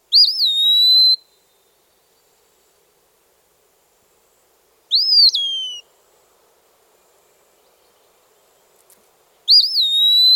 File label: Eastern Wood pewee